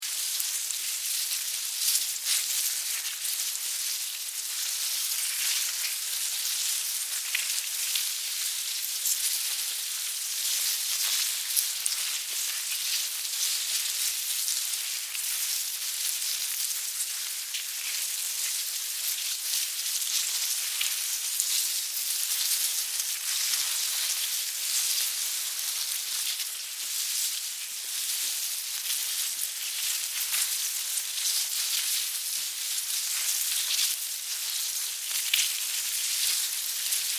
Ant Colony, Animal, Ant, Colony, Movement, Insects, Bugs; 5.1; Surround Sound; BlastWave